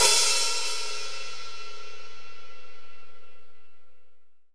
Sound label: AMB, AMBIENCE HH